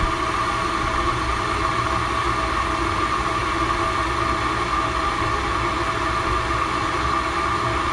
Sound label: boiling water; ragemap2023